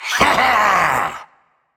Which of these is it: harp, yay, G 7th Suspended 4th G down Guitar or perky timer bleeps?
yay